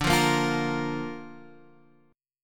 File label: D Minor Sharp 5th D down Guitar Half Step Down; Dm#5